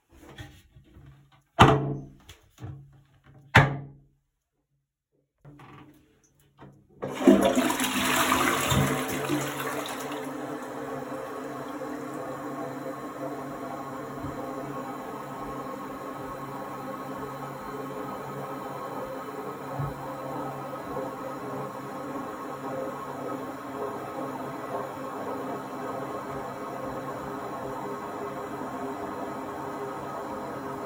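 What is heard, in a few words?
toilet flush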